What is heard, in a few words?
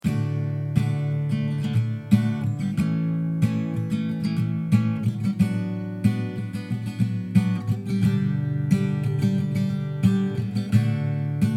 D90 Strummy Song Sans Words